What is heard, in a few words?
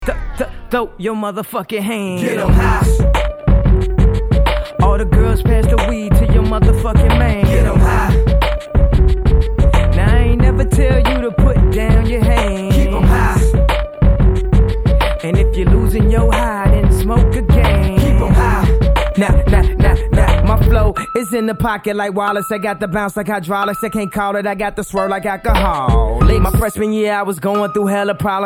GIT HI